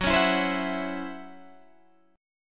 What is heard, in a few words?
C sharp Minor Major 7th Double Flat 5th F sharp, guitar chord